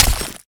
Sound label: Cryogen Hit